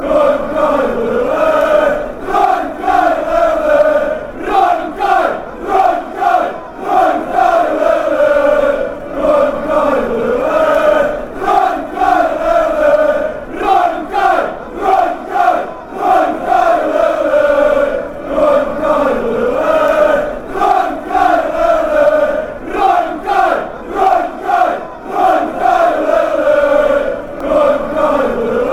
chant, fan chants; feynoord

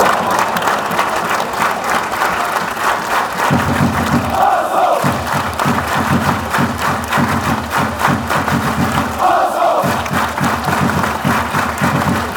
hsv with rhythm

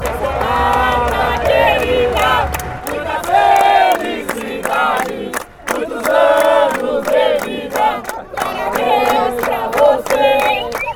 happy birthday fanchants

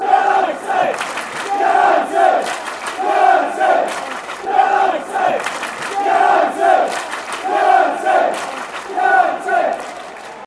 united clap; carlisle

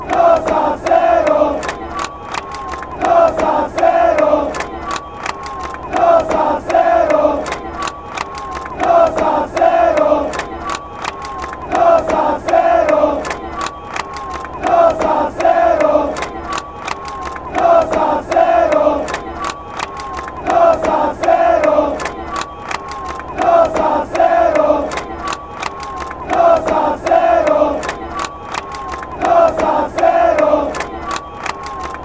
USA chant, fan chants

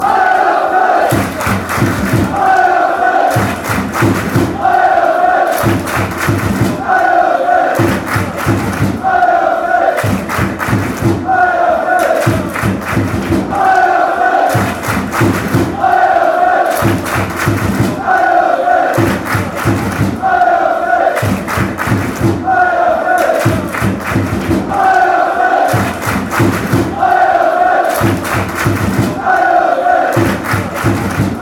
allez los allez, fan chants

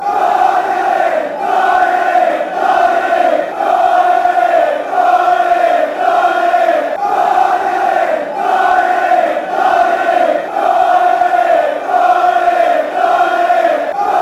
ale, fan chants; doria

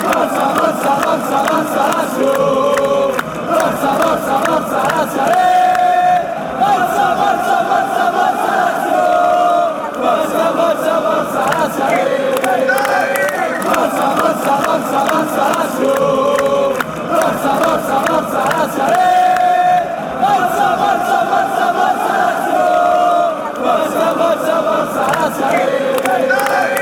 come on come on, chants

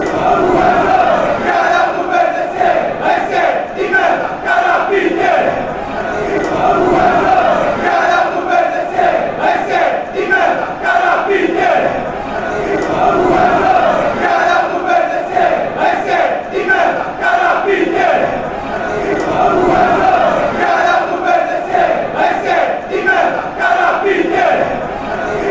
police man, chants

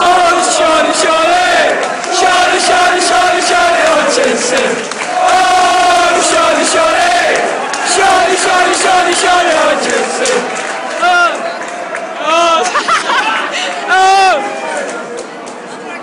oh, fanchants; shauny hutchinson

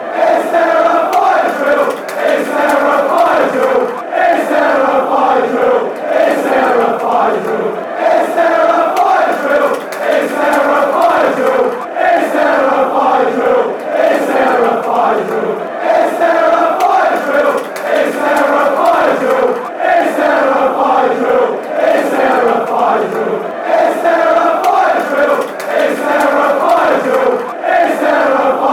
is there a fire drill fan chants

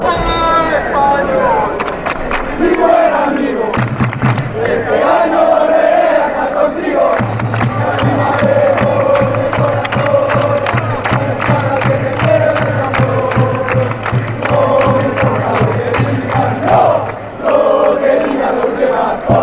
with you, fan chants

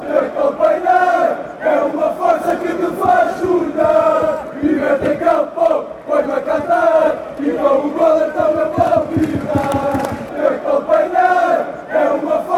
im going be thrilled fan chants

